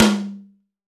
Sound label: TOM H, LA TOM